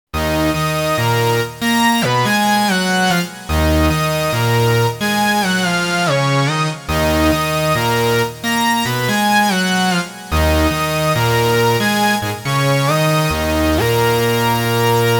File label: Megasaw